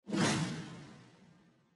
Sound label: Bonus Slide; slots; Outdoor America